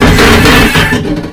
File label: Structure Crunch; The Avali's Audio Overhaul